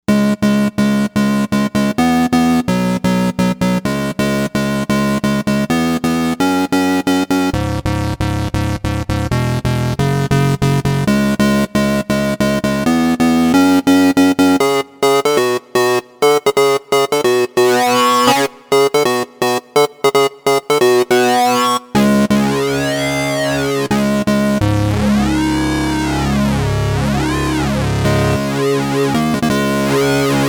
Electro Nasty, Novation UltraNova; Factory Patches

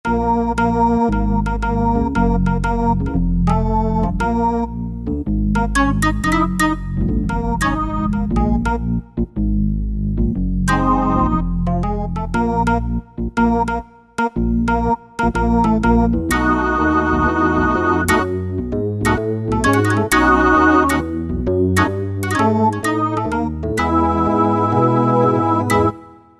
Korg MSC-9S Organ; Cool Track

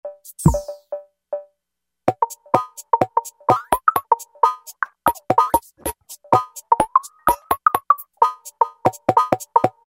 House & Ele; Factory Patches

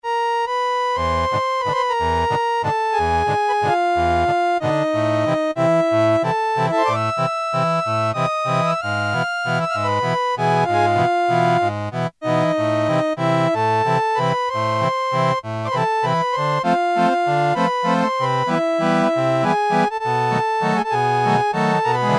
accordion, Technics SX-K450

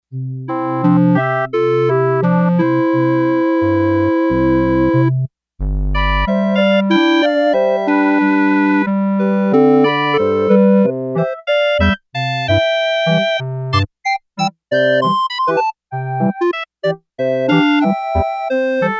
Wind Band, Yamaha DX11; Factory Performance Voices

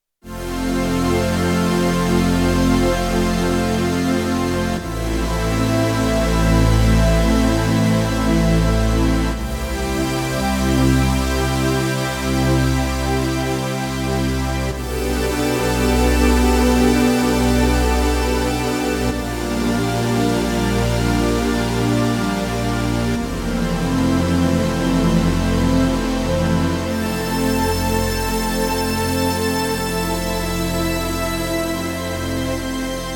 Massive Pad, Roland JD-800; Factory Patches

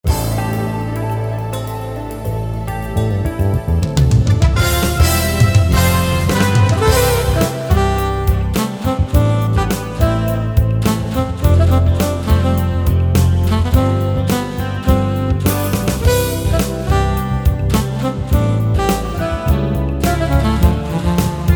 Sweet Tenor, Yamaha PSR-550; Factory demos

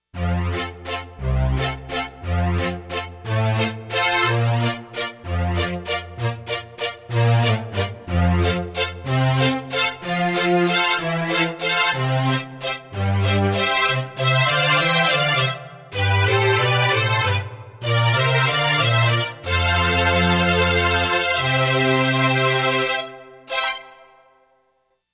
STRINGS, Roland JX-1; Factory presets

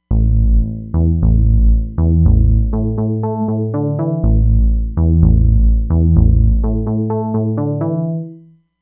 Bass

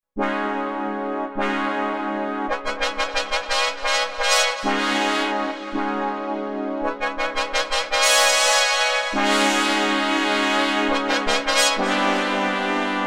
Spiral Ballad

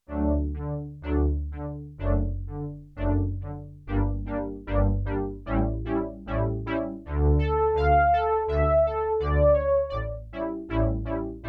Arco String, Roland JX-8P

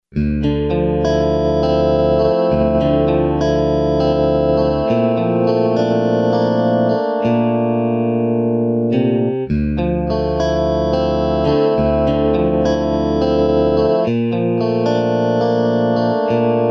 chorus, Yamaha REX50

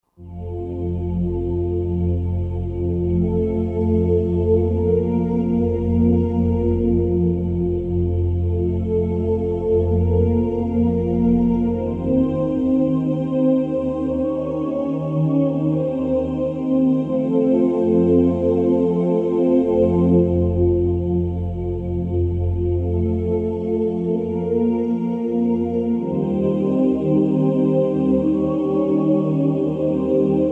Siberian Pad, Roland SR-JV80-13 Vocal Collection

